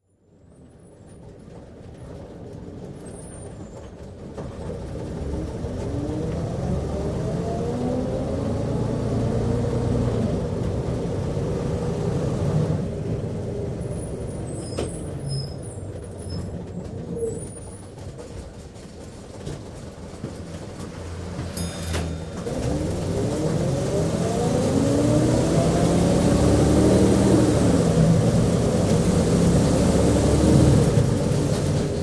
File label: Routemaster Double-Decker Bus, interior, constant run on lower deck, with bell rung once & twice; bell rung once at 0m23s & twice at 1m47s; BBC